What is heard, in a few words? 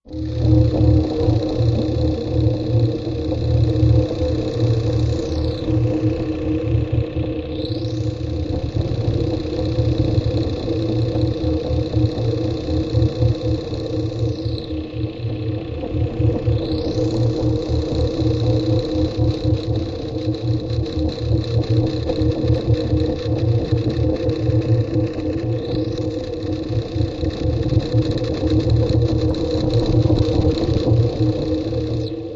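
Toy Intimidator Machine, drones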